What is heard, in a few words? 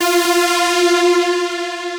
Lead - F